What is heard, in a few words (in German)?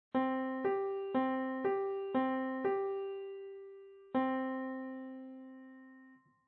Gehoer Quinten Quarten; Gehoerbildung